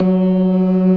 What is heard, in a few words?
CLASSIC C3, Synth and Organ